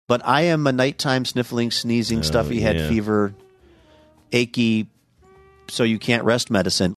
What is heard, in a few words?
sniffling sneezing